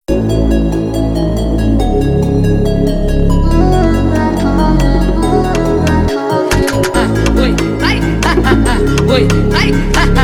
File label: phonk